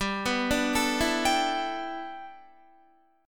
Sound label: G 6th Add 9th G Guitar, chord; G6add9 arpeggiated